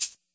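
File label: classic hip hop Shaker; Classic Hip Hop Mega Kit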